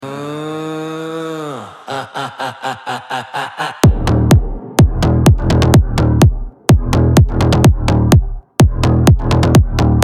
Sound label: beat; pop